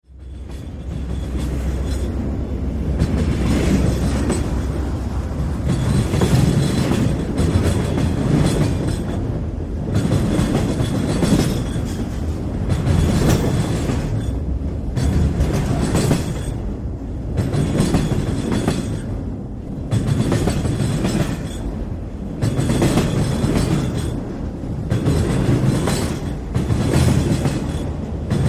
Train Cars By; Transportation; Train Sound Effects; Trains (Sound Effects:Vehicles); Blastwave

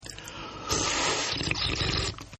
Slurp, Drinking; Human

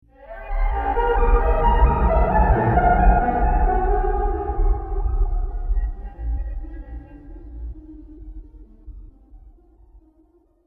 Welcome To Disturbia, scary soundscape; horror sound effect

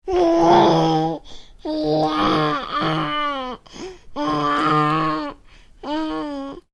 Baby: making vocal noises, slight growl; Babies; Voice; Stuart Duffield

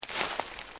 Footsteps Sneaker Leaves Deep Step; Footstep Foley; Human

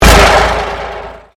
Convolution Pulse

